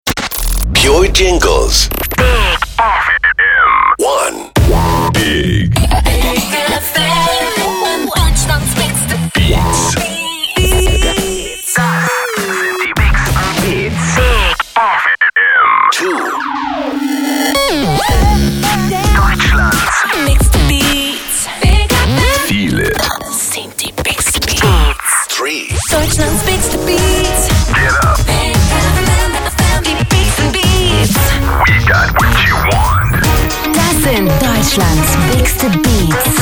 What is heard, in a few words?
Jingles; PURE; bigFM 2010